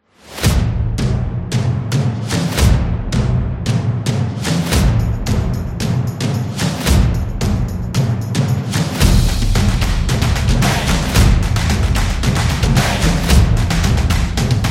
Drums Action Solo; percussive nation vol 7

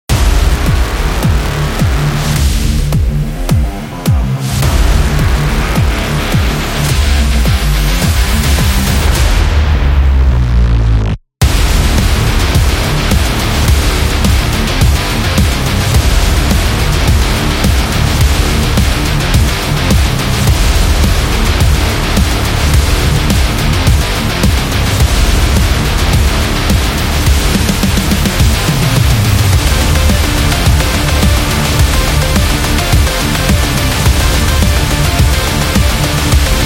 Trailer Metal; electro rock

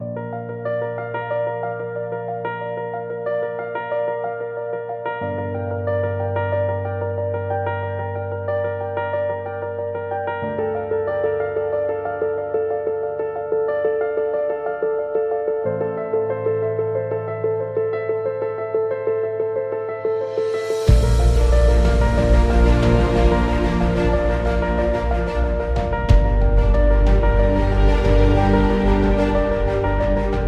Hopeful Piano; planetarium

